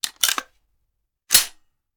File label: Machine Gun Foley; Sound Ideas; Guns